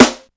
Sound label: warm steel snare drum sample b key